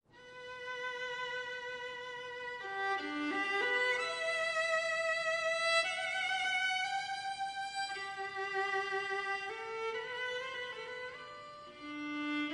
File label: Meditation, viola; from Thais